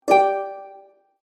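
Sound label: harp message ringtone, iphone sms ringtones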